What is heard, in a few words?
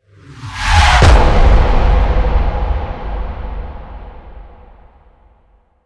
Firefight Hit Swell; Ninja Tracks